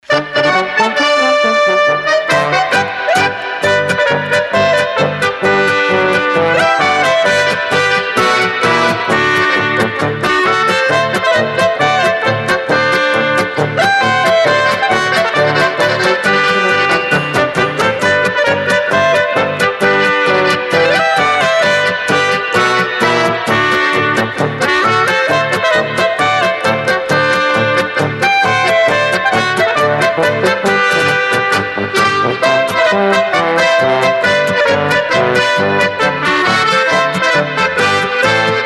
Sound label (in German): Feierabend-Polka